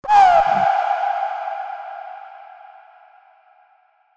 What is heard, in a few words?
Hype Vox